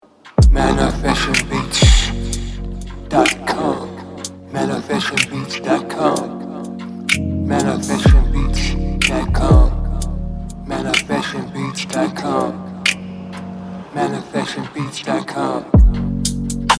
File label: Trap Soul